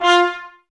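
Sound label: trumpets F, brass; 90 s Sample CDs; Miroslav Vitous; Woodwind Brass Ensemble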